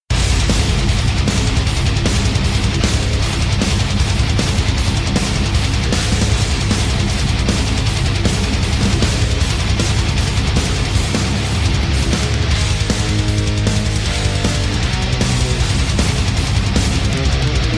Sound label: instrumental